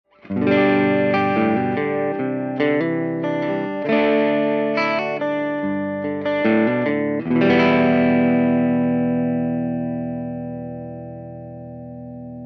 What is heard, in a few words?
convertible humbucker distortion, guitar